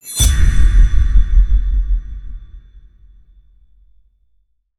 Impact, Tone to Hit, Metallic, Tonal Accent Up, Metallic, Very Fast, Deep Hit, Metal; Airborne Sound; Crisis Accents; Sound Design